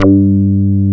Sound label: Synth Bass, Analog Bs